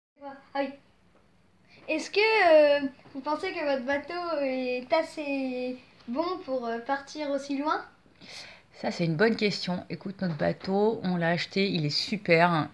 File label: Vocal